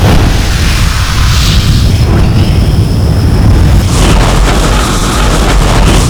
tank flame fire; nod

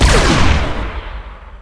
laser rifle fire

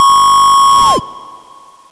computer alarm klaxon

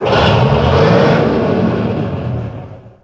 centurian start

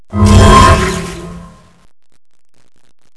tank stealth cloak

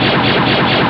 ship fire continuous

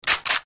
gun aa12 cocking

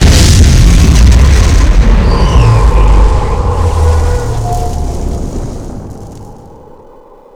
ion fire

